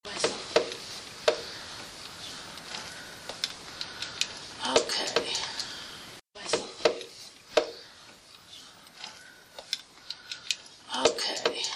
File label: voice clicks